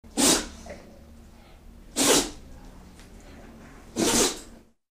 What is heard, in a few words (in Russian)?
zvuk chihanija sobaki